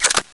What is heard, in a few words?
mag7 clip in, weapons; Counter-Strike Global Offensive; csgo